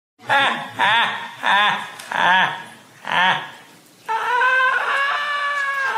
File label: joker laugh; jared leto